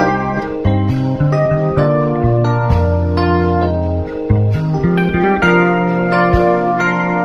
keys, Loops, Sad

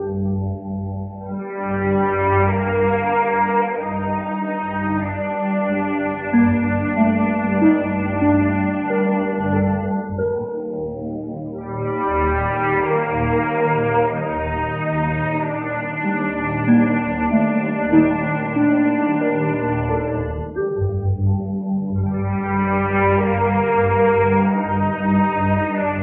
strings the mourning after; Loops; Sad

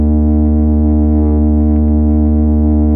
computer, Synths; Notes

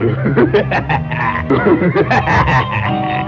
laugh demon

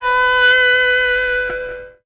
geetare, stabs

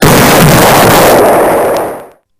explosion; Crash Bang Boom

